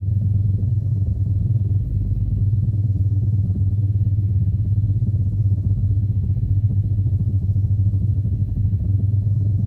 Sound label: ship engines; World Of Warships